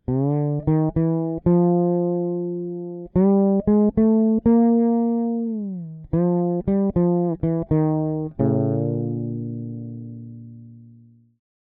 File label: Fretless Drive EQ bass boost mid deep boost; Tonehammer